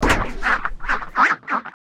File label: duck